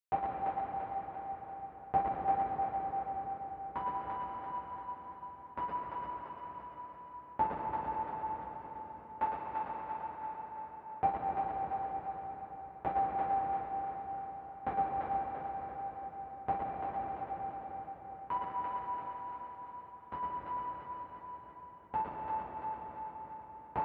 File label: 132 BPM E Min Pluck; Melody Loops; Ambient; Endless; Cymatics; ANALOG EVOLUTION Beta; Ambient Collection